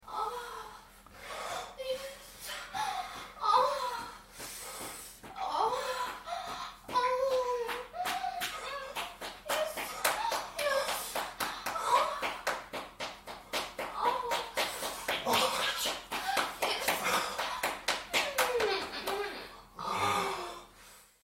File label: moan